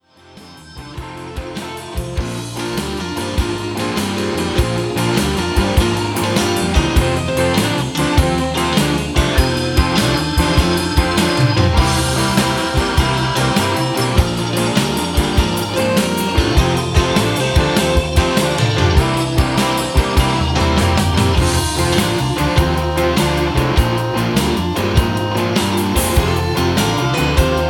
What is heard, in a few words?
South Side Blues in F